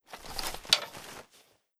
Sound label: nock